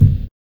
BD; E-Lab; X Static Goldmine 1

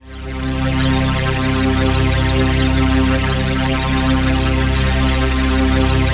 PHASE PAD, ATMO PADS; Best Service; XX Large

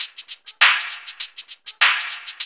LOOP; E-Lab; X Static Goldmine 2; akai

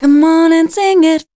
voice spectral, ly short

